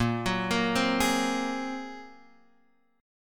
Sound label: A sharp 7th Suspended 2nd Sharp 5th A sharp